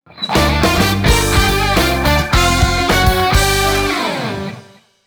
Level Complete Music; Bubble Trubble; Minis; PSP

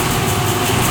Meca Gear Little Noise LOOP Verb; Puddle; puddle plus ios gamerip 2018

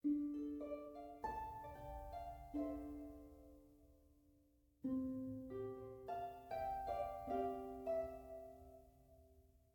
harp; gamerip

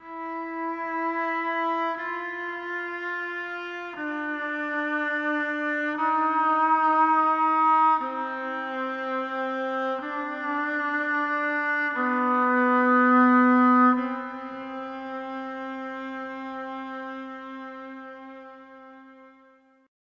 slow violin; dark fall ii lights out

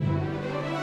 Music Menu 144bpm Start

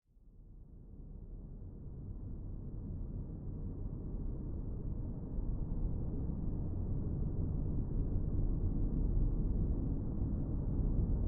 Debris Field, soundscape; Lliad; Death in Space

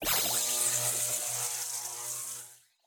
Electric Sparkles; Electricmombo Sparkles

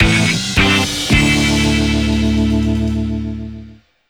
Jingle

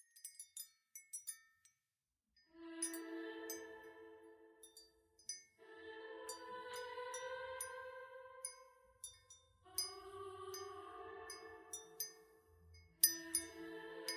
Ambient; steel fury khrakov 1942

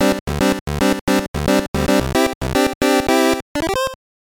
Jingle; Player Manager 2001; GBC

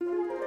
Comical Start 64bpm; Music Dialogue

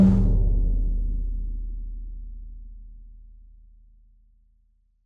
Mountain SFX, Gondola Drum Hit

